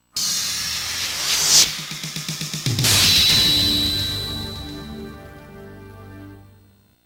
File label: jingle